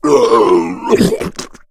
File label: boomer death